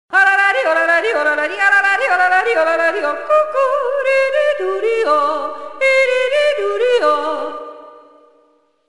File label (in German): happy jodel, ringtone